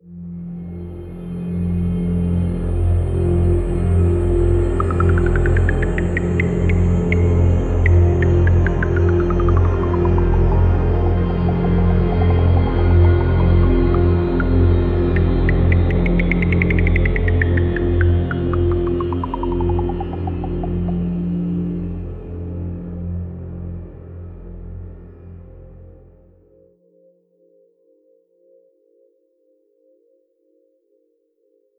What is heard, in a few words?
transition; time chicken tracks